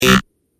Electronic Buzzer Deep Short; Miscellaneous Electronic Sound Effects

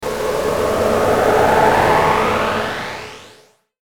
Ascend Sweep Distortion; Blastwave

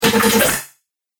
Broken Droid Bits, Robot; Robot Sound Effects

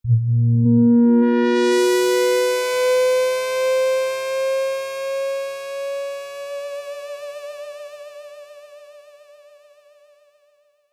Futuristic electronic ascending sound; Rises and Dives; Sound Design; Futuristic Rising

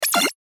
BUTTON DIGITAL, Short, Fast; Multimedia; Flash, Animation, Digital, Short, Transmission, Fast, Production Element, Imaging Element, Accent, Transition; Blastwave

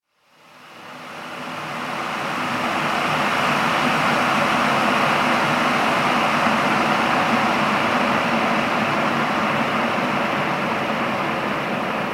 household kettle boil off; Electric Kettle Boils Then Automatically Switches / Clicks Off; Kitchen; Kettle Boiling

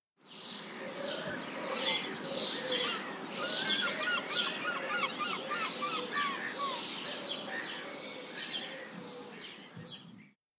Birds Pigeons And Seagulls; Outside Ambience Sound Effect; Bird Sound Effects; Andrew Fleming

